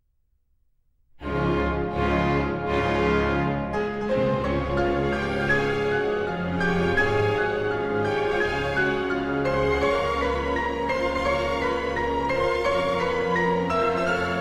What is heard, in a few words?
Piano Concerto in D-Flat Major, Allegro Brioso; Prokofiev; Piano Concerto No. 1